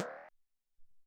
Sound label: Zap